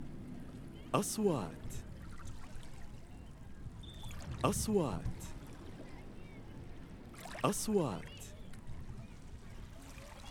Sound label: Beach Waves